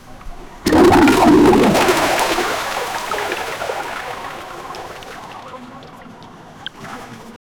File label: a diver plunging into the water with a loud splash